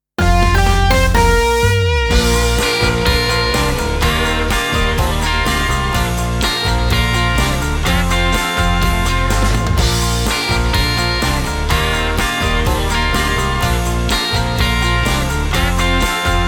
Sung Jingle, 125bpm Bb Major, RAMP; KJIC 2020

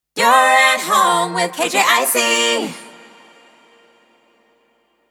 Sung Jingle 125bpm Bb Major ACAPELLA; KJIC 2020

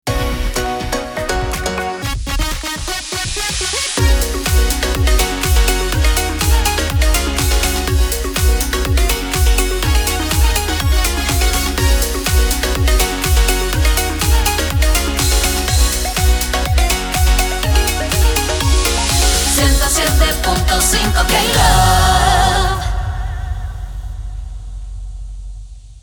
Sung Jingle 123bpm Gb Major RAMP; KLove

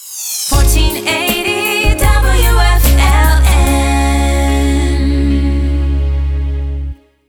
Jingle Sting; WFLN 1480

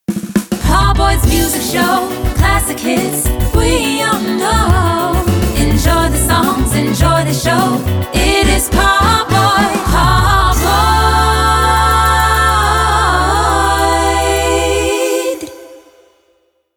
Jingle Slogan 140bpm

